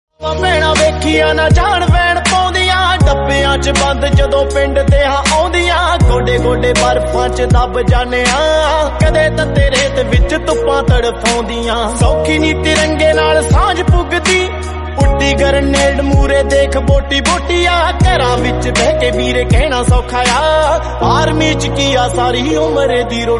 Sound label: army ringtone; pawitar